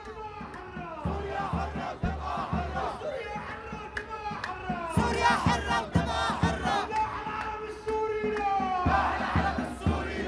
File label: syrian chant lower